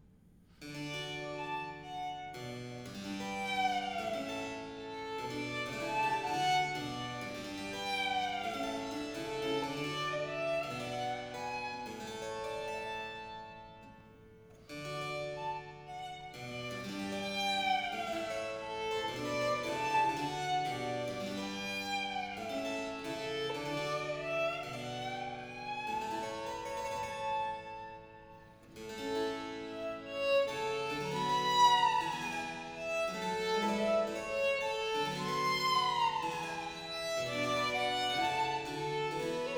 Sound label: Sonata violino solo representativa, for violin & continuo; Biber; Representatio Avium; A major